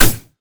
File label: blade surge; LoL; irelia